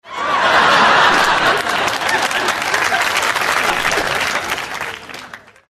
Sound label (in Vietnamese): Tiếng khán giả reo hò cười sảng khoái vỗ tay